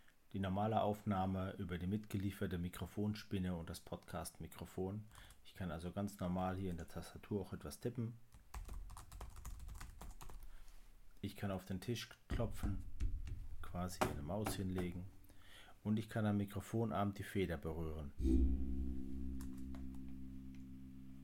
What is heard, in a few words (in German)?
mikrofonspinne audio